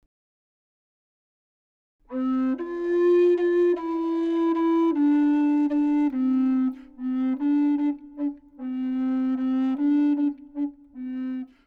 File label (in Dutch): Welke blokfluit hoor je